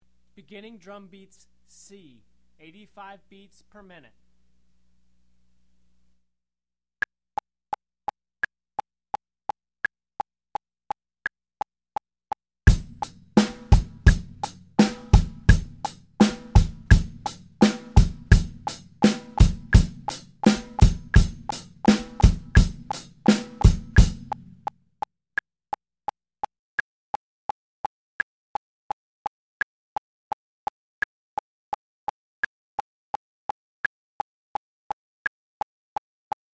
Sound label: beginner drum beats; Drum Lessons